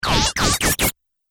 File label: Velcro Laser Blasts; Sci-Fi